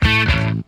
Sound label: giddy guitty, stabs